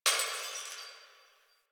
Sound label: Distant Glass Breaking; Warframe; Ambience; NineteenNinetyNine